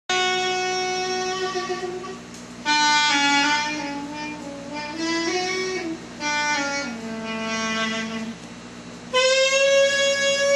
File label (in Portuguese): show clarinete